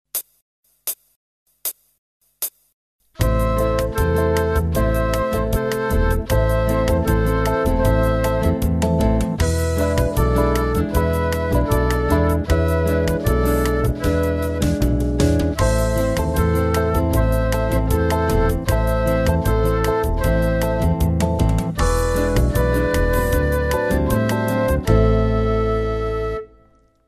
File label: ABC song, flute